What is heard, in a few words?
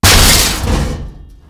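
debris metal medium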